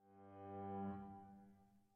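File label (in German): Variation 30 für 1 Clavier Quodlibet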